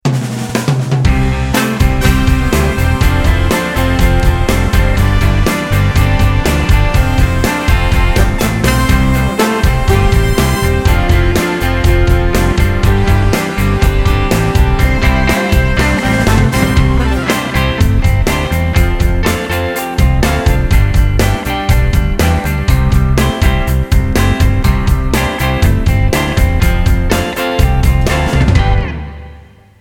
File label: Instrumental